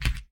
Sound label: Keypress Return; Huawei P30